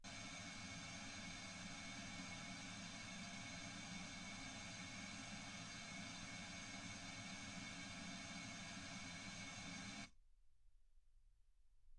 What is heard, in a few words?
wgn 48kHz